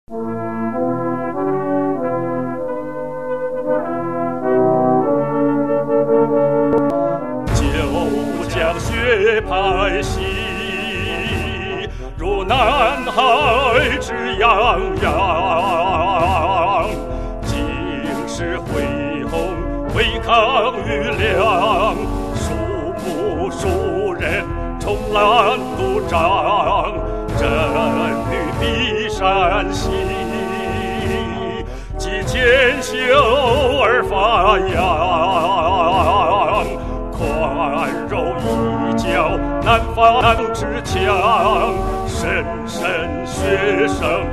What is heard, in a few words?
school song